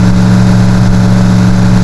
low load; CRC2005; CRC 2005 Demo

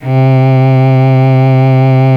cello; GMTones; Strings & orchestra

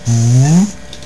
Cloak; Star Fighters 1.6

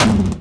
antilag ext; Rally Xtreme; cars

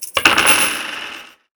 Kaching; Cyberopoly; The Alfred University Edition

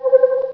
gate; acdemo; AC Demo 3-14-98 RipCord

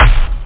Bass Drum; MarcDrive

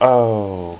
oooh low, voice

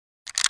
kar clip reload, weapons foley; Spearhead Demo

